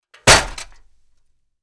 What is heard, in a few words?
metal ladder hits; ضربه به نرده فلزی; industrial sounds